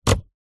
vehicles car wiper stick switch move flick toyota rav4; zapsplat